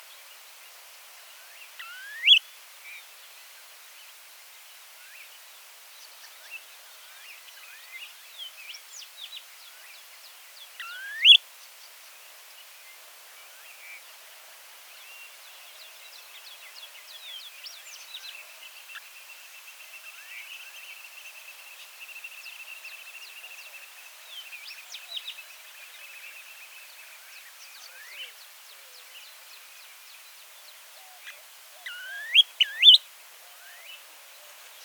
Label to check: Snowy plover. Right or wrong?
Right